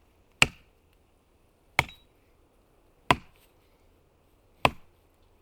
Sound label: axe chop wood